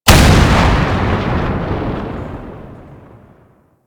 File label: Tank Cannon Shot; Weapons; Warframe; Lasrian